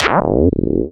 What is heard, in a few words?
Misc Synth FX; musicradar; cartoon caper